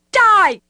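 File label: attack; NWNDS; Edwina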